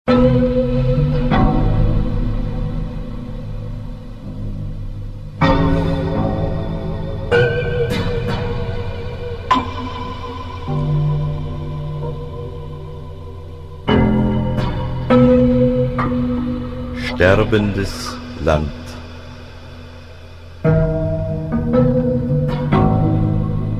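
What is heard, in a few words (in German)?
Der klangerweiterte Flügel Live-Elektronik; cd ausschnitte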